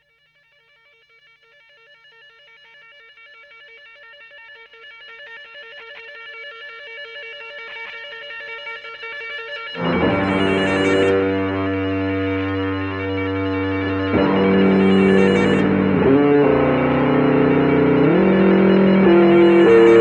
Loop III And Solo; Washington Square Church NY

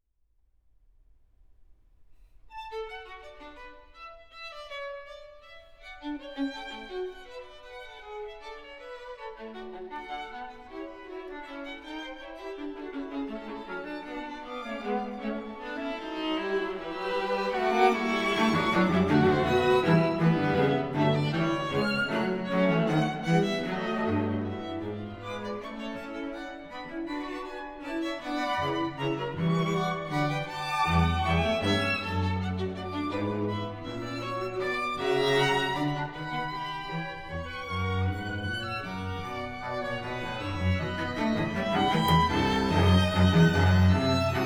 Fugue in D Major, String Quintets; Op. 137; Ludwig van Beethoven; Beethoven - String Quintets Op. 29 & 104

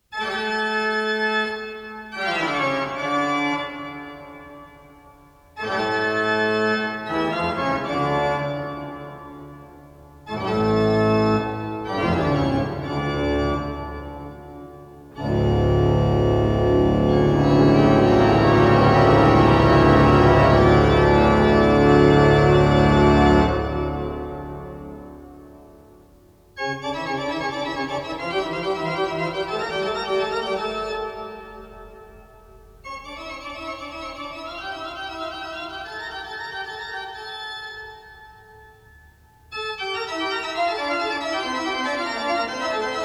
Toccata in D minor, Bach Great Organ Works; Johann Sebastian Bach; Toccata Fugue in D minor, BWV565

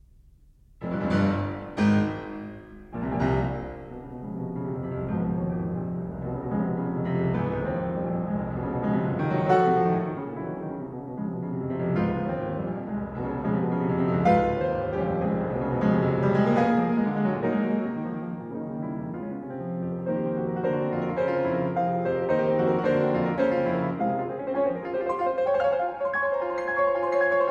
Prelude in F minor, piano; 24 Preludes

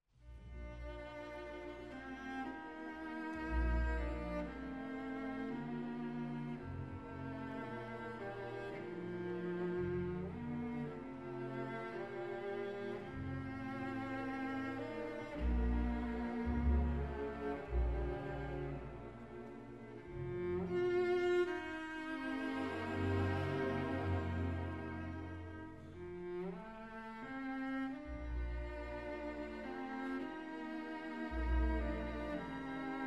Piano Concerto No. 2 in B flat; Brahms

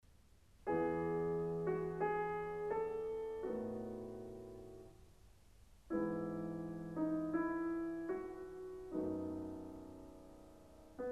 Piano Sonata No. 3 in C major; Wilhelm Kempff; Beethoven; Piano Sonatas

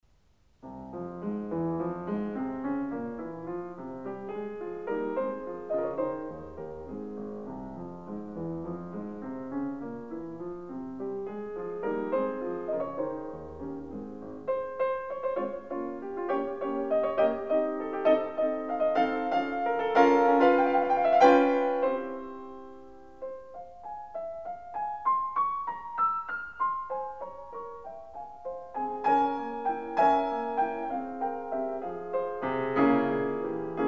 Piano Sonata No. 6 in F major; Wilhelm Kempff; Beethoven; Piano Sonatas

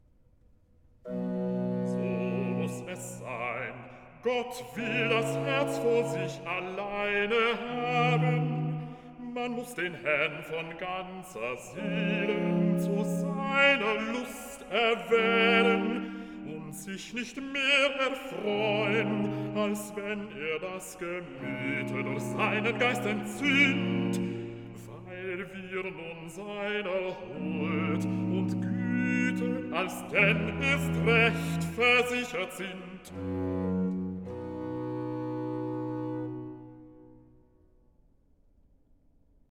Recitative Bass; Du sollt Gott, deinen Herren, lieben, BWV 77; So muss er sein; Bach; J S Bach; Cantatas, Vol 13

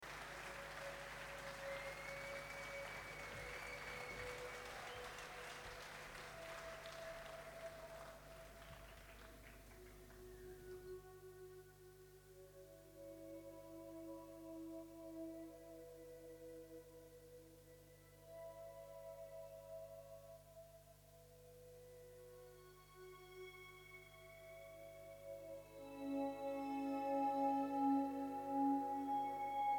Soundscape, String Quintet; Cosenza

